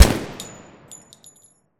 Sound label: shoot; tacz; RSWS 1903